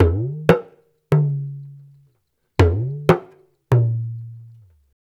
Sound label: AFRO, African Contemporary, AfroTriangle